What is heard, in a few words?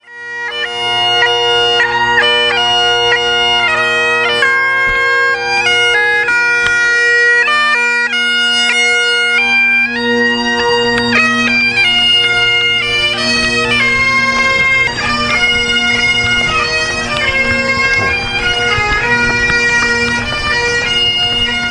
bagpipes; openhouse